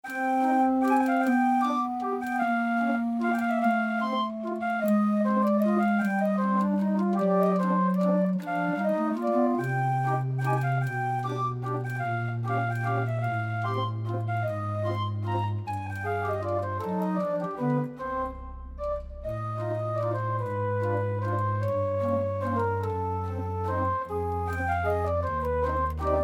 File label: Punchcard Waltz, Barrel Organ